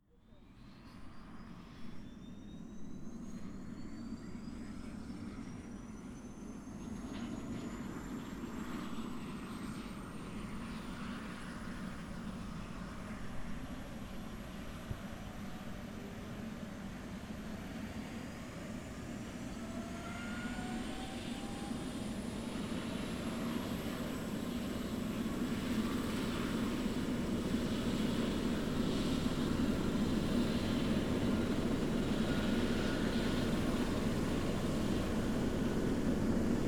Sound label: ambience